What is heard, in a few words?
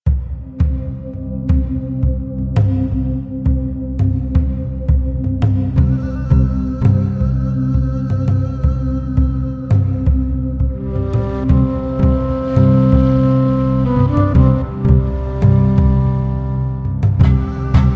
Music; DS9FX